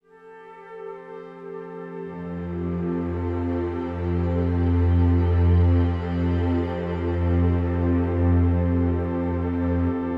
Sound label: Waves